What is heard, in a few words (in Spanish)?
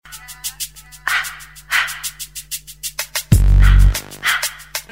cortina; elcamino